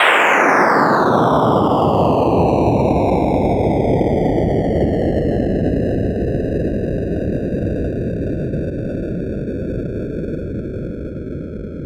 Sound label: Downlifter